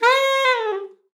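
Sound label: slide C#5, saxophone; Best Service ProSamples vol.42 - Session Instruments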